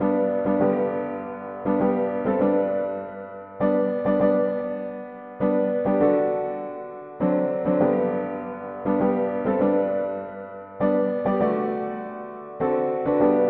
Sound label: mono piano; Entends-tu le chant joyeux; hymns